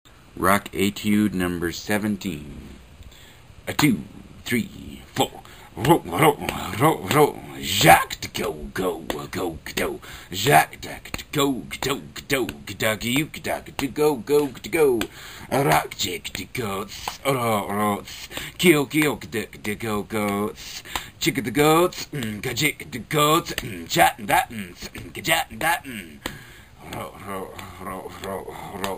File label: rock etude slow